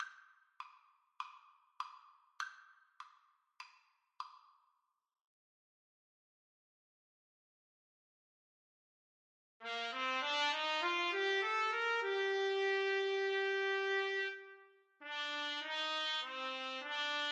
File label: submarine warfare trumpet duet